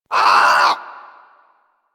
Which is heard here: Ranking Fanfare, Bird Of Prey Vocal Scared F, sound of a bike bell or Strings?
Bird Of Prey Vocal Scared F